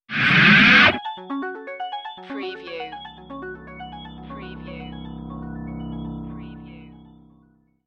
Scifi Audio Logo Ident, Harsh Appearance, Digital Afterglow